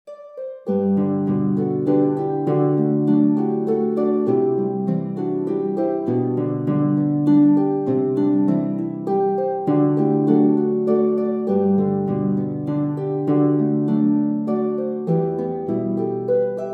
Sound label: Australian Waltz (harps)